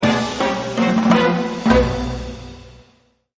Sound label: Fail Sting; Legends of the Hidden Temple - Unlock the Past